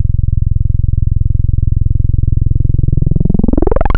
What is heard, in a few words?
303 Acid; Synthetic's Serum Collection; Cymatics - 303 Acid for Serum Vol 2 Wavetables